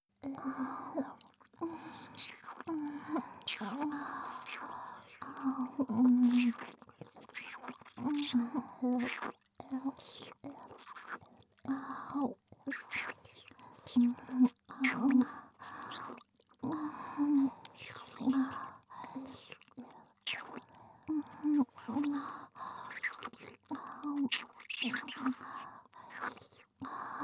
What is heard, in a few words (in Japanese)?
Ｗ ベロチュー ループ